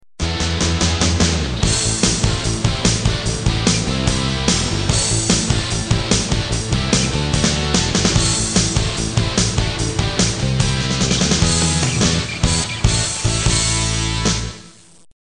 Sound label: sequenz